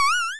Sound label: Cry; Pokemon Solar Light & Lunar Dark 1.0.1; Cries